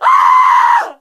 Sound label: horrified, witch voice retreat; npc; Left 4 Dead 2